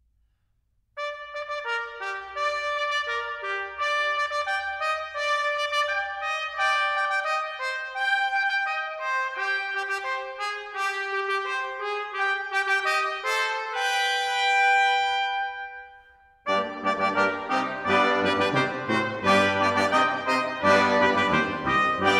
Intrada

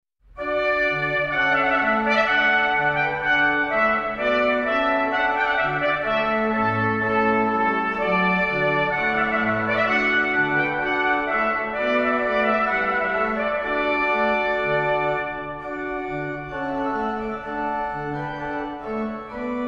Wedding Music

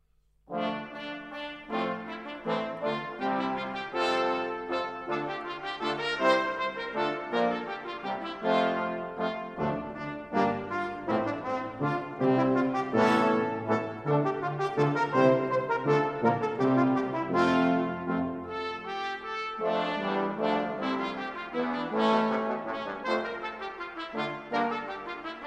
Capriol Suite

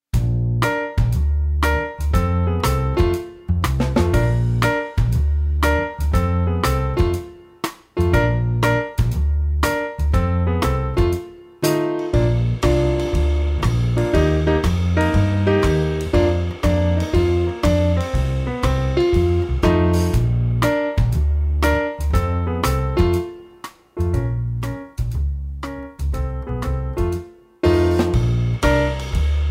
Jazz Bsn

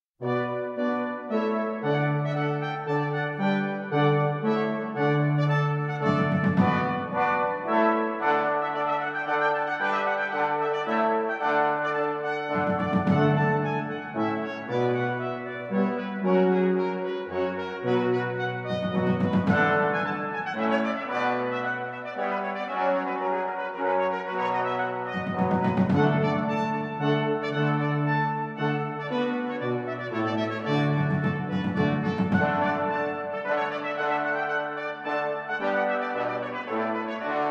Tudor Suite

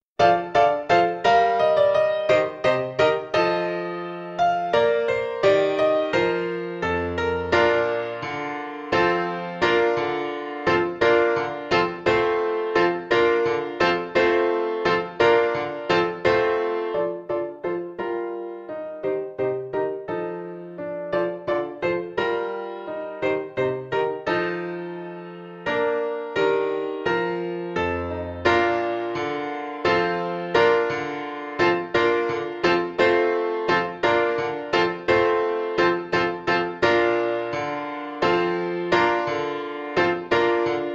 Alla Hornpipe; Handel

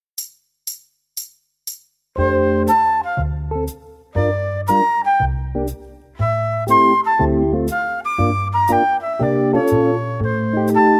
Flute

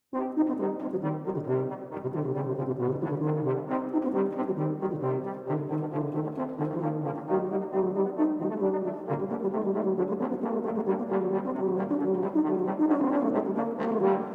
Badinerie

